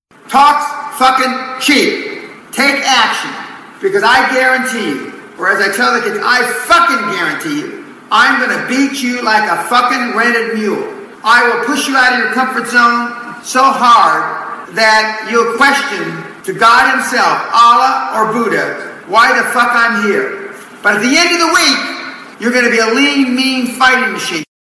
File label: morning wake up ringtone